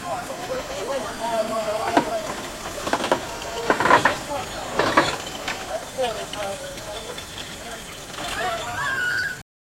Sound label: rural